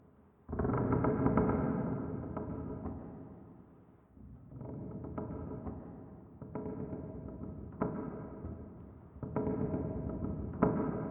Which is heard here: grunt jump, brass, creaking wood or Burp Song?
creaking wood